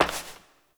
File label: Step Glass; Warframe; Koumei